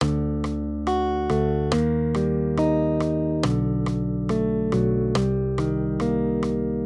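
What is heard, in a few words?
Accompaniment, Major Triads, D-Eb, 140 BPM, guitar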